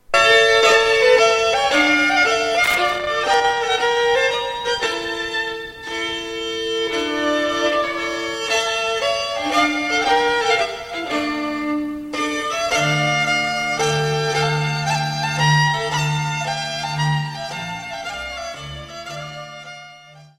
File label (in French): Ijs Waltz - Pays-Bas